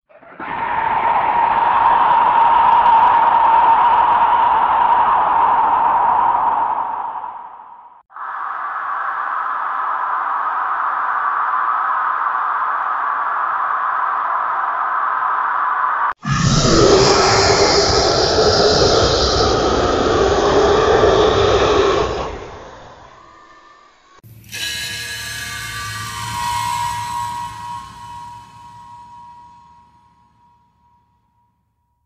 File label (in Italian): suoni paurosi scary sound horror; effetti sonori